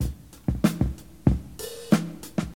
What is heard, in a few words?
126 bpm drum groove c key, kick tuned to the C note